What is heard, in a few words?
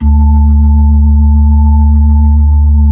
B-3, Blues